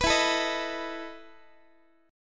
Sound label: E Major 7th Suspended 4th E, Guitar; x,x,14,14,13,14; 4A,4E,5A,5D,5G#,6C#; strummed